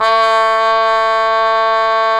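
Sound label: brass cup mute trumpet, dry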